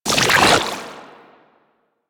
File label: Tidal Augment Deactivate; Warframe; Warframes; Pirate